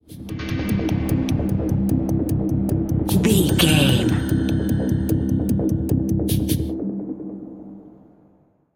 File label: suspenseful ticking stinger